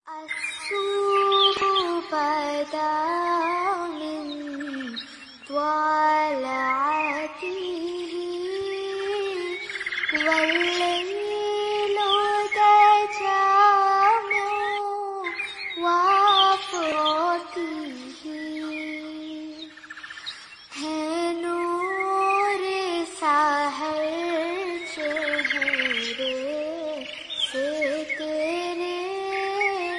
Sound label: Female Version Naat Ringtone